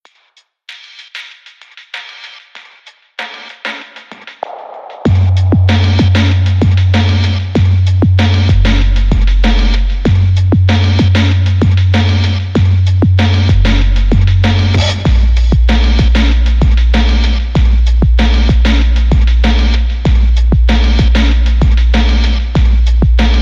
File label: Spray Beat